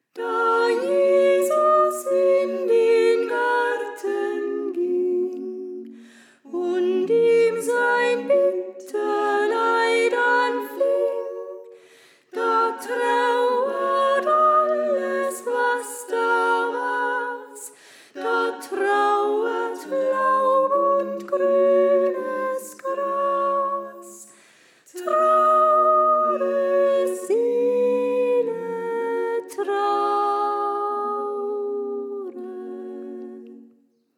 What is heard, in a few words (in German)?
Sopran; Da Jesus In Den Garten Ging